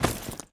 body impact soft; physics

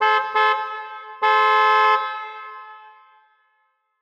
car honk distant; ambient

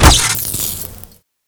taser hit; weapons; Counter Strike Model Pack; CS:GO

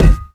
physics cube lg; Portal 2

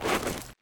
sand, footsteps

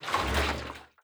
contents full, metal barrel; physics; props

